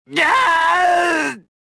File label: death; reborn